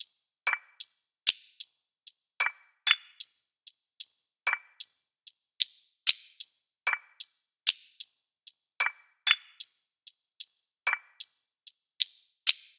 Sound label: Percussion Loop 75 BPM; Drum Loops; Cymatics; Eternity Sample Pack